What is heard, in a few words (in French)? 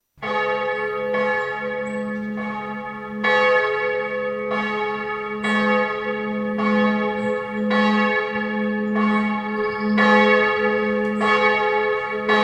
instruments cloche